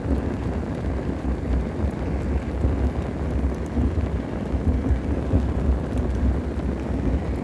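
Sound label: small fire loop; Star Wars Knights Of The Old Republic I; XBOX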